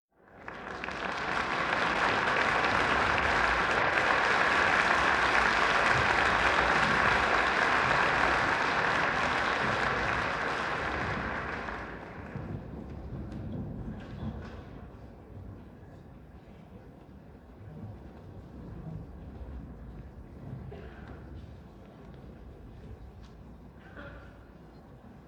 Applause; Sviatoslav Richter; Sofia; Sofia 1958

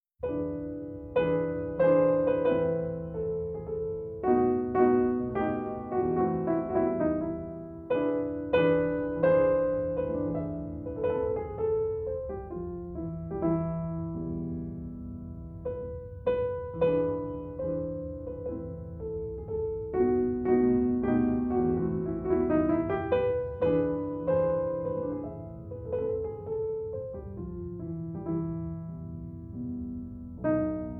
Mazurka in A Minor; les Inédits

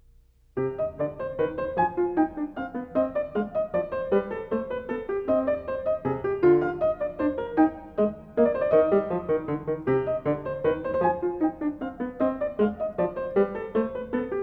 Menuett; Glenn Gould; Bach The French Suites Nos. 1-4, BWV 812-815

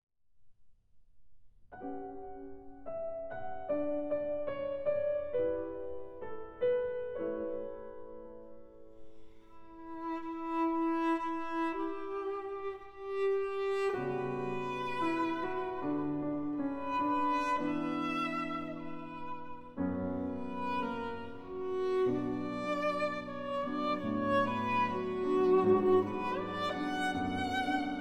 Cello & Piano; 2 Romances No. 1, L'âme évaporée, L. 79 (Arr. for Cello & Piano by Juliette Herlin); Dialogue Debussy & Schumann

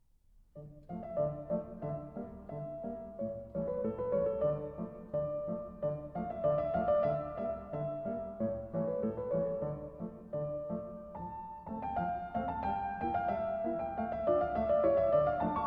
Polka in D Minor; Alexander Malofeev

